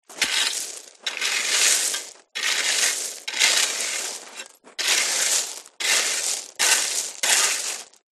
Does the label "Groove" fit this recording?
No